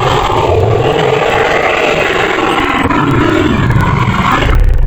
Komodo Dragon, Wavetables; Cymatics; Serum; Animals for Serum - Gold Edition

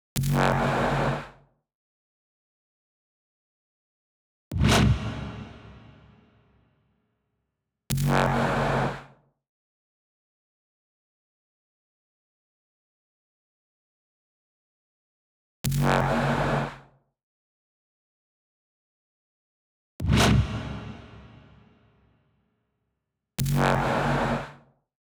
Extra Bass, Future House 124 BPM E; Big EDM; Future House Tools; Construction Kits; Power

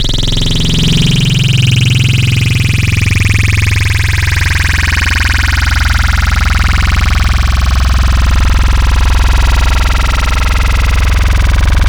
Growl Wavetables; Spectral Melt; Cymatics; SERUM; Virus Wavetables

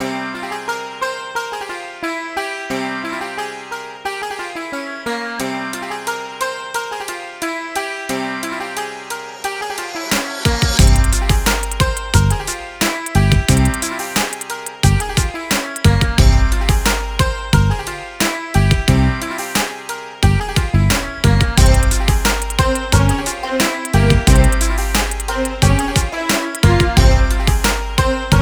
089 Cmin, Hip Hop Exotica

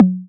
EDM Future Percussion; Big EDM; Future House Tools

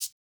Shaker Hit; 080 Cmin; Hip Hop Exotica; Big Fish Audio

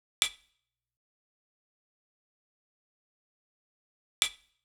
Perc Loop, EDM 120 bpm; Future House; Drop; Ableton Projects; Big EDM 120 bpm (Drop) Project

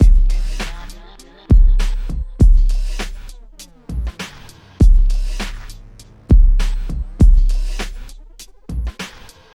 Drums Combo; 100 Bmin

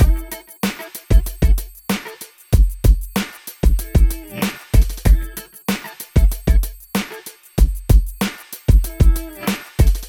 Guitar drums; 095 Emin; AppleLoops

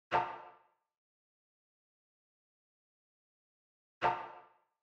Perc loop, Big EDM Drum Loops 123 BPM; Future House; Future House Tools

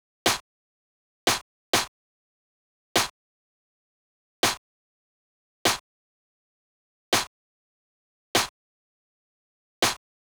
Snare; big fish audio; Hip Hop Exotica; Apple Loops

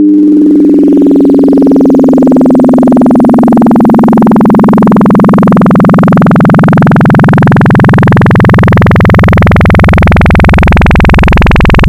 Kick, wavetables; Cymatics; Wavetables Collection Vol 3; Serum

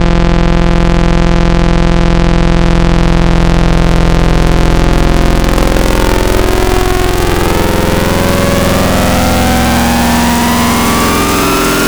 Shatter, Wavetables; Cymatics; Wavetables Collection Vol 3; Serum

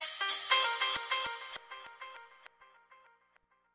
Stab; Thirty-One Flavors

